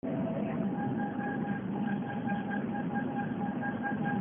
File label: new brake pads rotors squeal brake sound